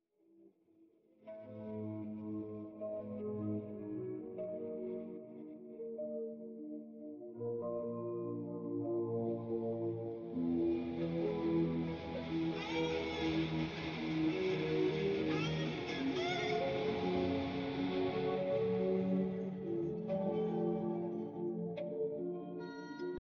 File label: Noise